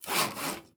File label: construction saw